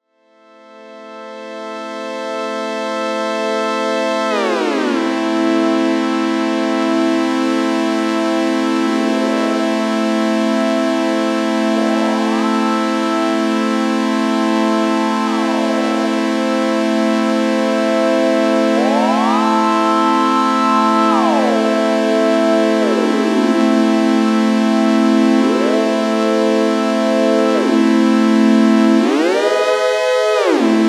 Novation Peak Pad

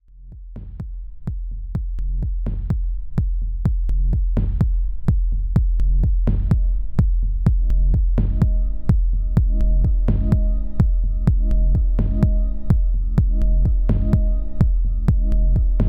Elektron Digitakt Heavy Compression

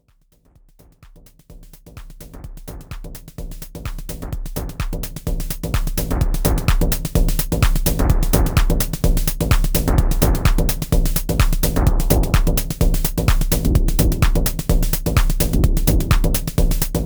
MFB Tanzbär 2 glitchless

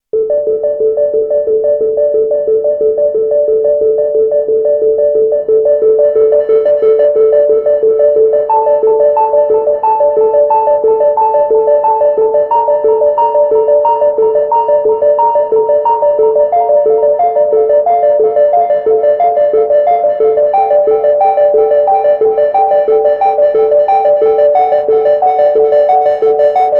Arp FM Noise

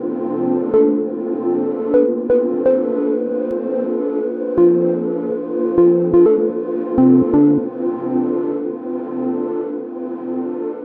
Synth Nonlinear

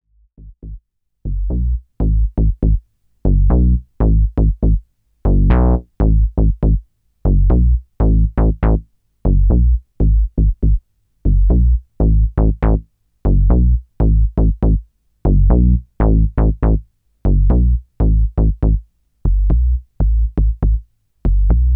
Novation Peak Bass

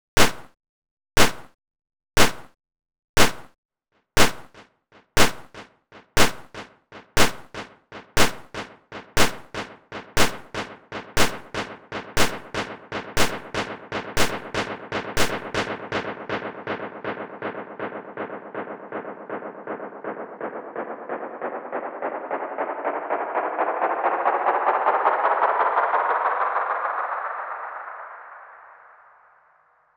Echo; Abeton 10 Test

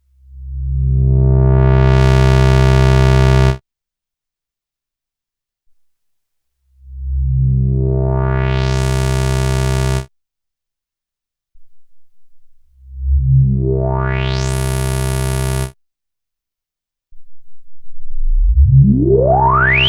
Filter

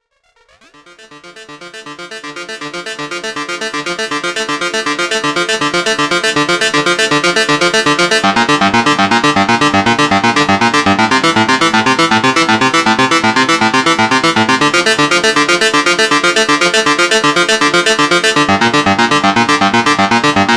Novation Peak Arp

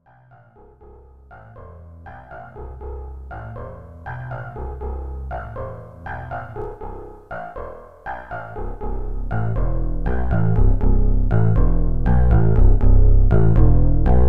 Bass Seq

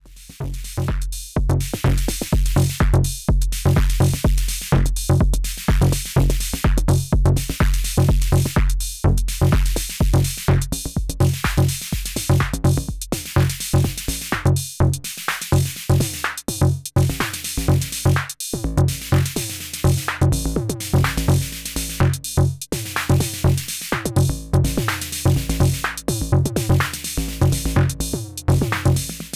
tech house

